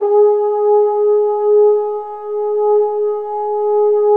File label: French Horns, G#3